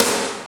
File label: SNR, snares; Roland - Rhythm Section; Snares 1; Sn Modules 1